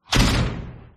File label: door close